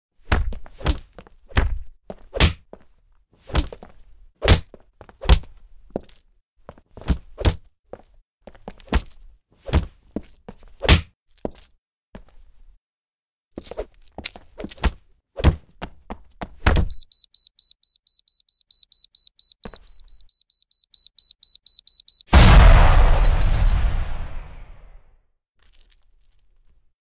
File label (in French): Bruitage Combat